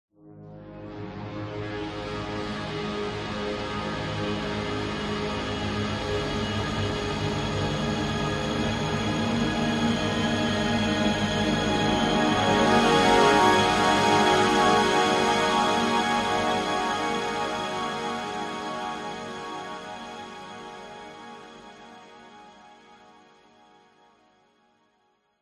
sound design cinematic orchestral tension dramatic swell drone and strings; into horn; zapsplat